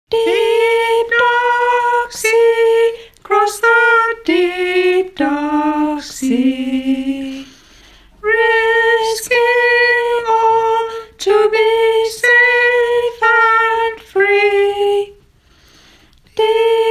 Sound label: Soprano